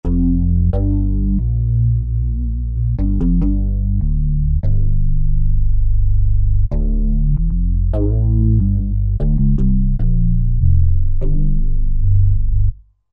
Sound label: Phaz Sub Bass, Roland SR-JV80-12 Hip Hop Collection